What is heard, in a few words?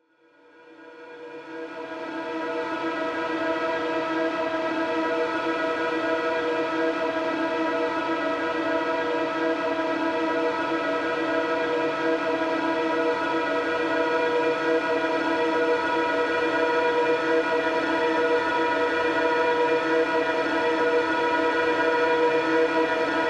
Backyard